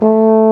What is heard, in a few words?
BRS BARI A2, FRENCH HORNS; Roland - LA Composer vol. 1